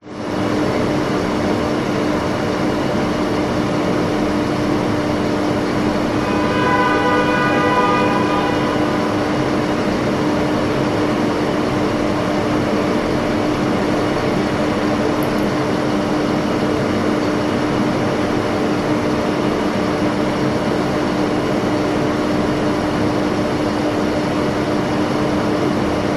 toilet bathroom amb internal apartment top floor city noise outside; household